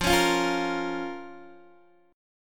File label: F Suspended 4th Sharp 5th Guitar Open D, chord; x,8,11,11,8,8